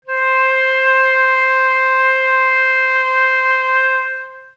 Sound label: flute c5